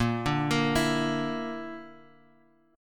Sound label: Bb Major Flat 5th, Guitar, Open F; arpeggiated